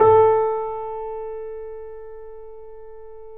A, The Piano; InVision Interactive - The Piano; BOESINGSOFT

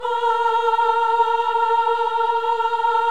VIB A#4, VIBRATO, Voices; Spectrasonics

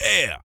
VOC PERC, Vocal Planet Jazz FX BASS PERC

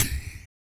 BIG BREATH

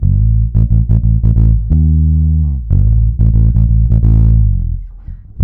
A, bass; Spectrasonics; Bass Legends CD 1; S.BRONX

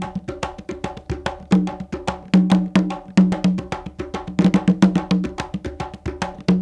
Bembe 6/8, World Dance; Spectrasonics; Supreme Beats

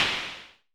SN Low Punch, Vermona Mono15 Drums Snare

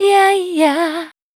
VOC F, Dance Vocals, FEMALE

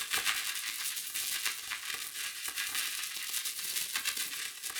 Cracklature, sparse soundscape

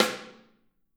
Snare OH; Snare OH All; Drums Percussion

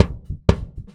Heartbeat Loop, Kicks; Airwindows

